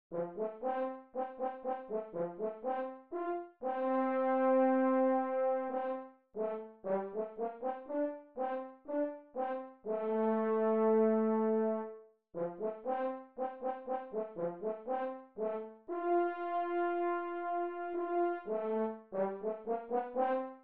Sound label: horn etude, 60